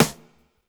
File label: CHILL SNARE; AMG Gota Yashiki Groove Activator